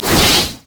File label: mage chains; LoL; ironforge xerath